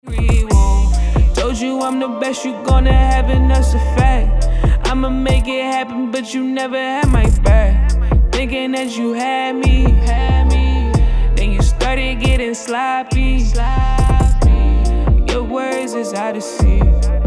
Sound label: HIP HOP